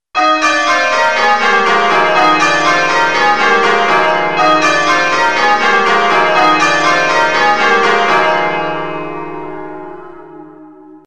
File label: Rounds, changeringing